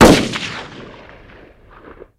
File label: Gun Ar15 Sngl Shot